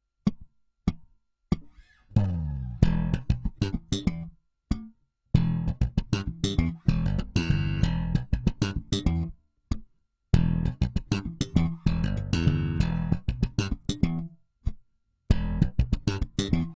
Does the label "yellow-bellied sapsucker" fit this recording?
No